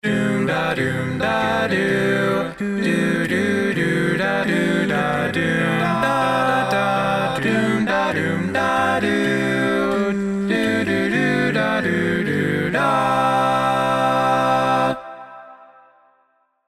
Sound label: All Parts, Barbershop Tags